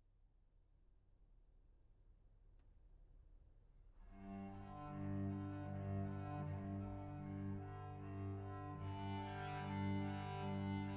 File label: Trio elegiaque No. 1 in G minor - Lento lugubre